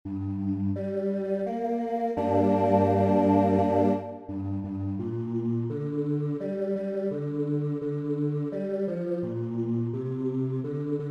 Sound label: Bass